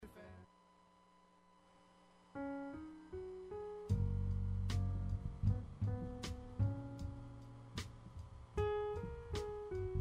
jazz

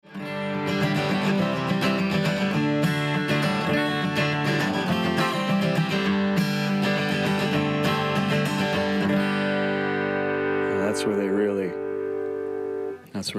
Martin Gibson Folk

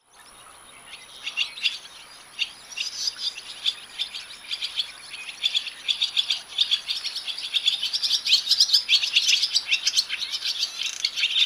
barn swallow; paul marvin

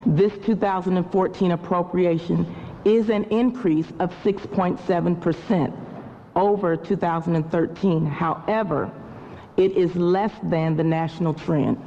bass

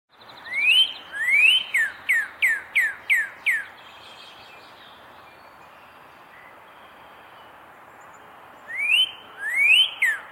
Northern Cardinal song

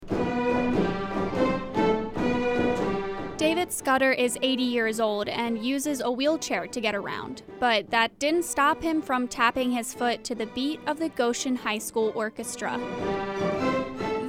violin; goshen

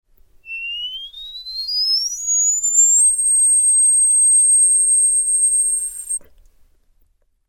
World Record Whistle

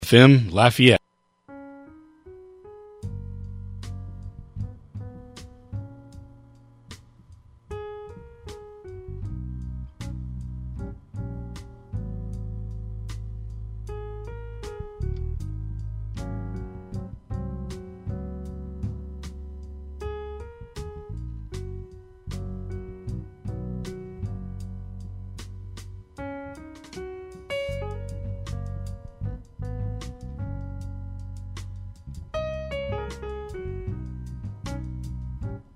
jazz

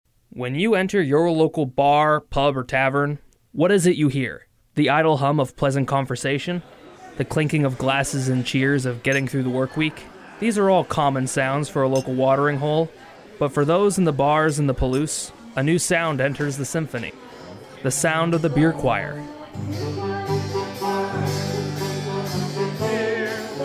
beer choir radio

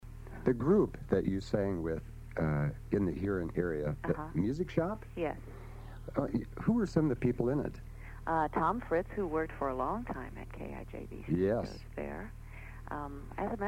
singing music shop

